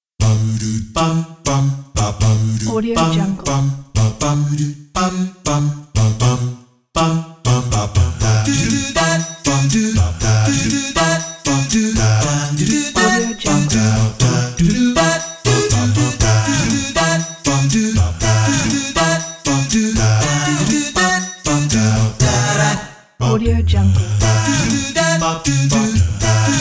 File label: mens acapella